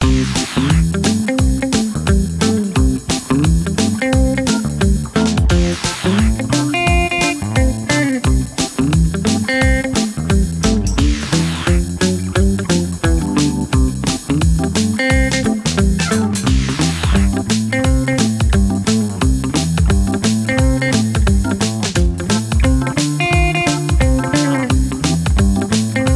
Jump Jiving, loop, ringtone; MIUI12

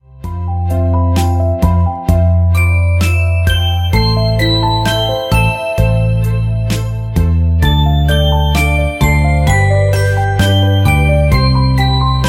RINGTONE; MIUI12

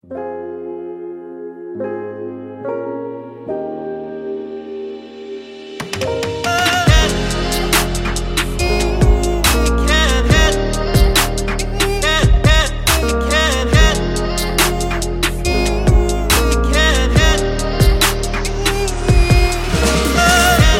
Stylish Intro Trap; MIUI14; HyperOS 1.0

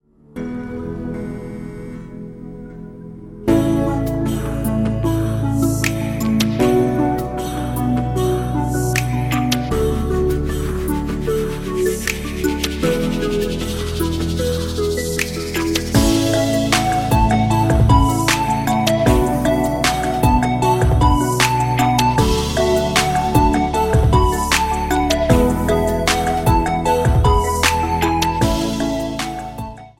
Rap, ringtone; MIUI12

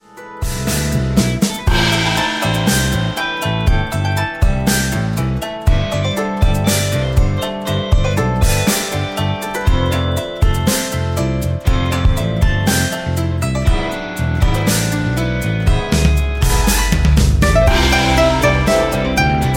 Mid Autumn Festival pop, ringtone; MIUI12

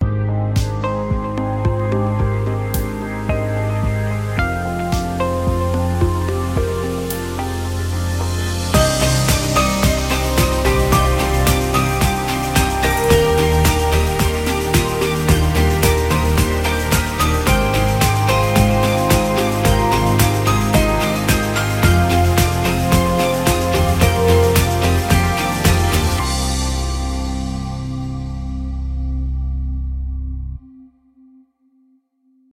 Ambient Technology Rock; MIUI12

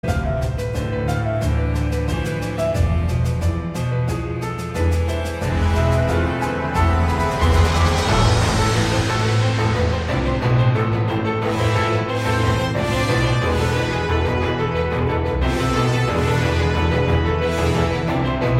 Orchestration; Orchestration Three; MIUI12

